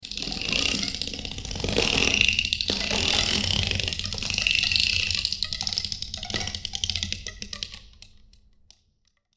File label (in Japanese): テープのり 長い